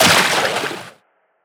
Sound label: Splash, Water